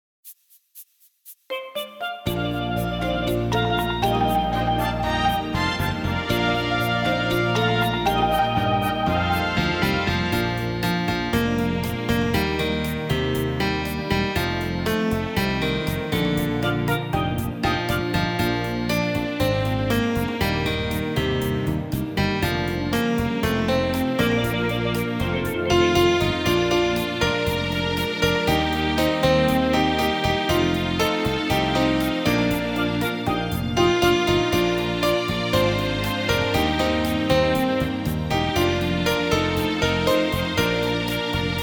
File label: SATB instr